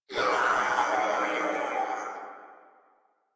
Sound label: death crewmen; Warframe; Corpus Crewmen; Crewman B